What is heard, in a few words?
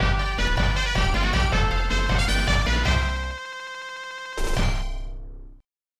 Unused Jingle; Mighty Max; SNES